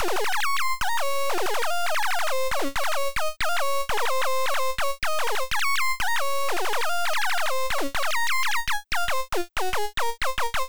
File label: Lead hamo